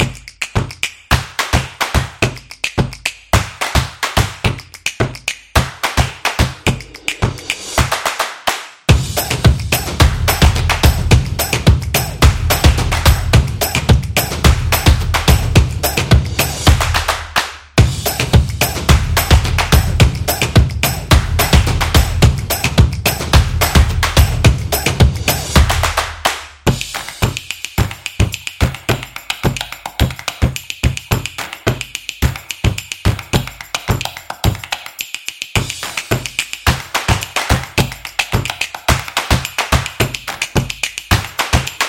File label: Beauty Abstract